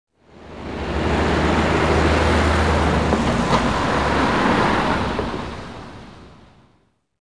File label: traffic